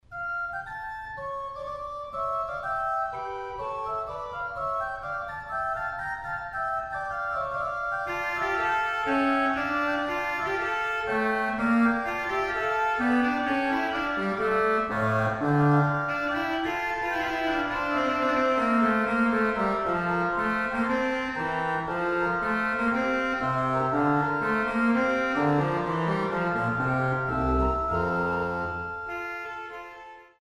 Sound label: Basse de trompete, orgel